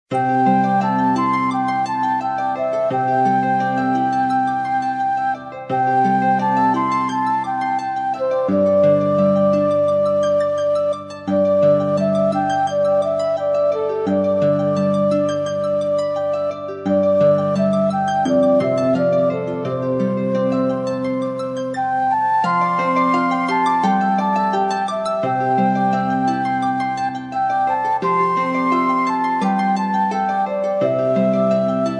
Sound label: BGM